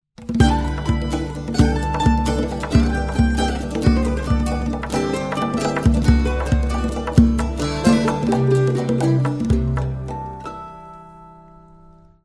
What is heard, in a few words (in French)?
mandoline